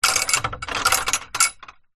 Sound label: household gate wooden metal latch unlock open